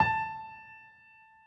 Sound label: soft hit whistle; osu!